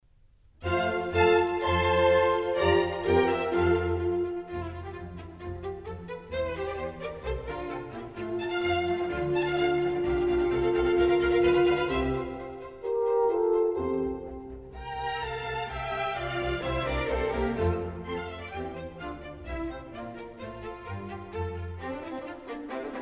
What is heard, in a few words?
Contredanse in F; Mozart